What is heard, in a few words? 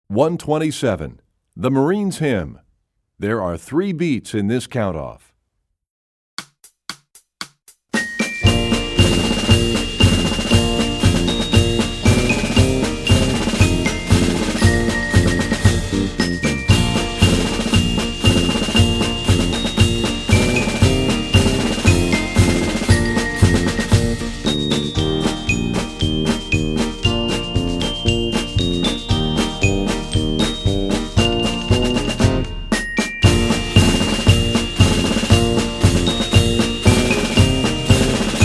Percussion; The Marines Hymn; EE2000 Book2